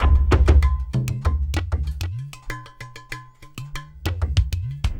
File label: Chunk Vase, Drums; Sonic Foundry (Sony Creative Software); Friendly Fire; Marc Steve